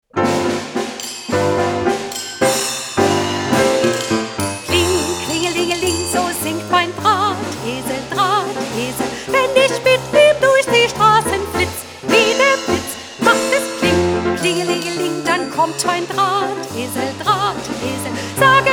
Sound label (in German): schnell mit Gesang